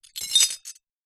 plate dish ceramic broken pieces set down on ground